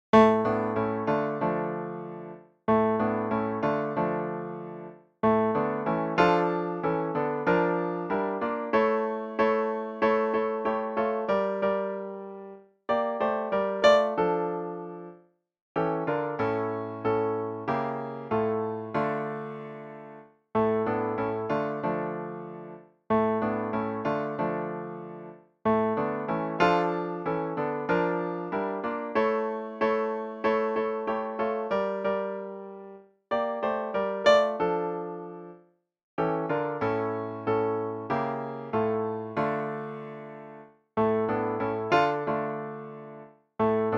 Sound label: bella ciao piano